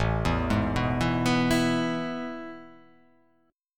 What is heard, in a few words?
A Major 7th Suspended 2nd, chord